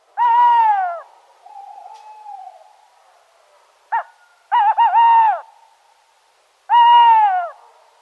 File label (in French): Chouette